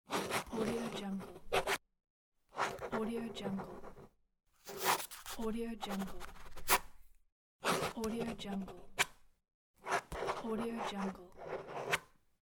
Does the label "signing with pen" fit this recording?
Yes